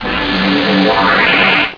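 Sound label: freeze gun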